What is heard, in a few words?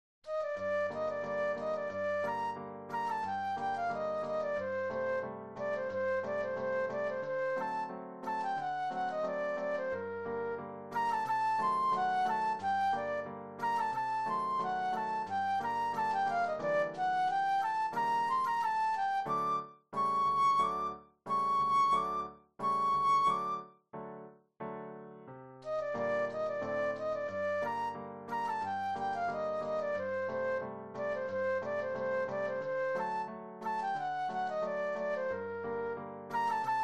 Flute Solo; classical